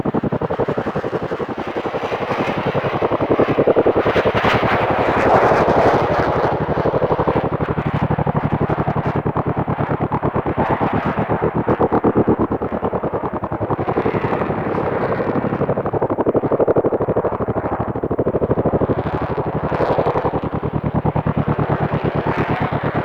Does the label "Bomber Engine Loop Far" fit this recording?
Yes